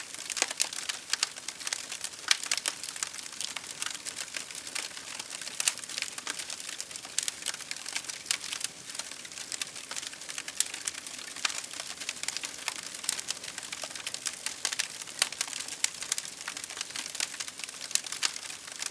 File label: campfire; ambiance